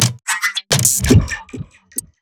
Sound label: drum fill darkened